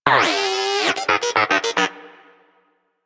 Moa Inquisitive Vocal Enemy Spotted; Warframe

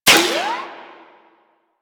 Sniper Ricochet; DE; Warframe; Frumentarius; Exalted Sniper

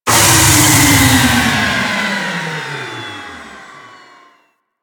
Stealth Movement Wind Down; Warframe; Vehicles; Archwing Stealth

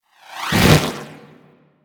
Idle Wing Flap; Dragon Pelt; Warframe

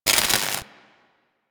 Worker Welder Start; Grn Worker Welder

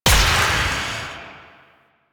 Shot Medium, Weapons; Duviri; Incarnon Adapters

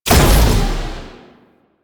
Shot, Weapons; Strun Prime

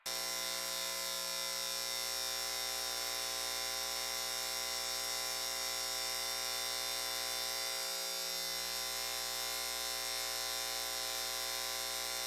Street Light Loop; Warframe; Ambience; Nineteen Ninety Nine

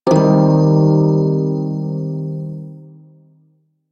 Shawzin Min Chord; Warframe; Prime Shawzin

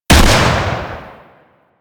Double Barrel Shotgun Shot; Warframe; Tenno

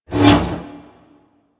Frame Movement; DE; Warframe; Vay Hek

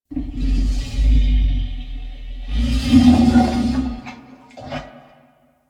Wolf Ambient Call; Animals; DE; Warframe; Quests; Duviri

